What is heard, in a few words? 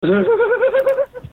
ahuhuhuhue